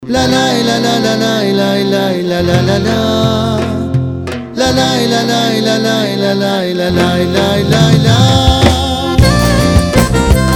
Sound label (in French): ronfle